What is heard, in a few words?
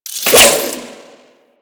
Whip Whoosh; Grineer